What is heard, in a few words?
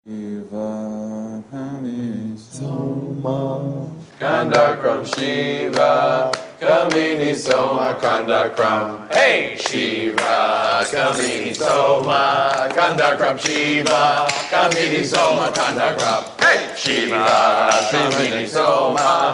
Shiva Shiva Shiva English Accent Chanting Ringtone